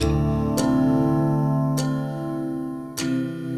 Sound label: Dalang Walk, Ubud Loop; Sonic Foundry (Sony Creative Software) - Friendly Fire